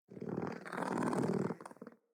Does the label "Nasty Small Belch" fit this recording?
No